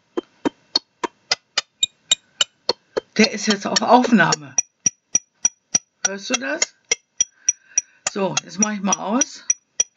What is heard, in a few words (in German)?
Aufnahme läuft, Mikrofon spinnt; Mikrofon spinnt bei Asus Zenbook; seltsame Klopfgeräusche; Windows 10; Headset